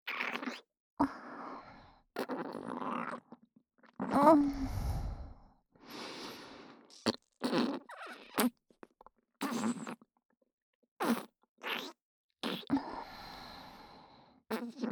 ダウナー ねっとり左耳フェラ

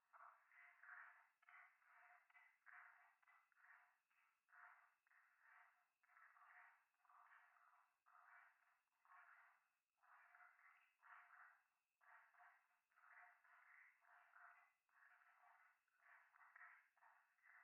夢の中 フェラチオ

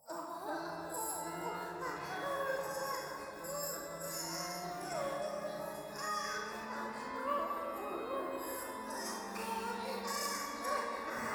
低音喘ぎ 讃美歌

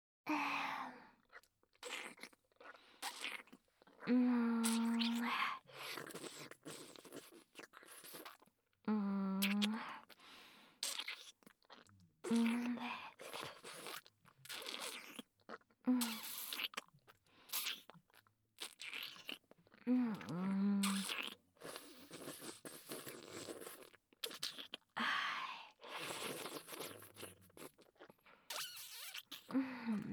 フェラチオ; SEあり

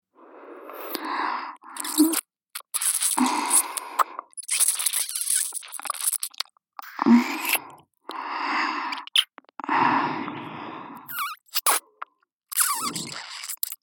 左耳舐め (ループ); メイド様; SEあり

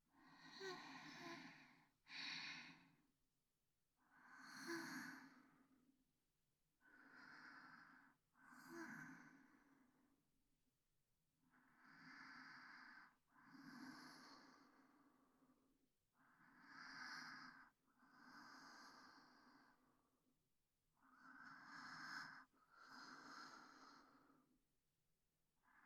寝息; SEなし

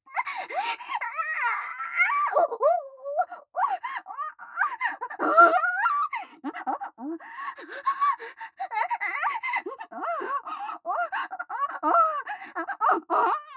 完堕ち喘ぎ; リネ

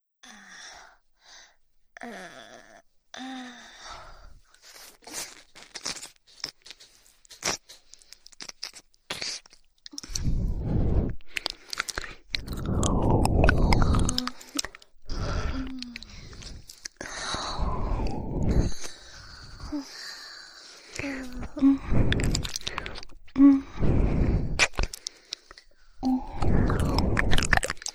お姉さんの唾液たっぷりな癒しの耳舐めASMR

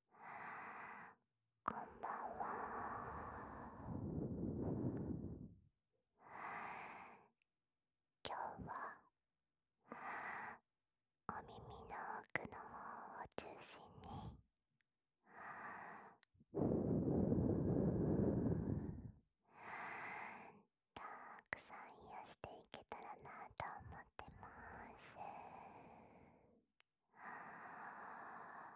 耳奥を攻めながら耳ふー

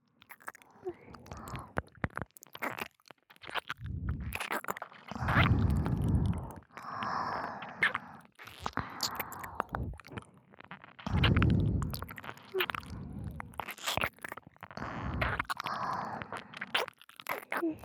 両耳 舐め ループ 音声

